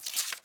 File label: Pickup Paper Note; Pickups